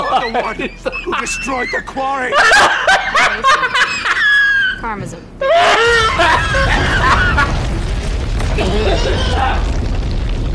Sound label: cough laugh